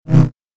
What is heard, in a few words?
Double Bladed Saber Sound; FXHome